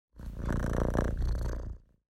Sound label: cat idle; Minetest; VoxeLibre; mobs mc